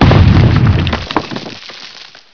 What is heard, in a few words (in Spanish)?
piedra, destruccion; ruidos